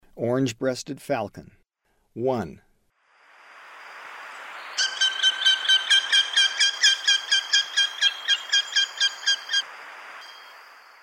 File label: Orange breasted Falcon, Birds of Ecuador; S. America